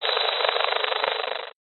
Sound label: science fiction radio signal interference burst